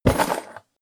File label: toolbox handling rattle